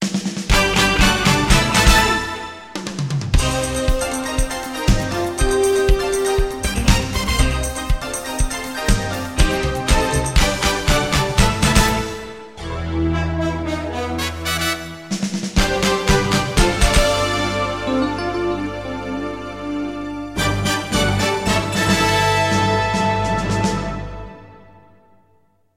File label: talkback jingles; lbc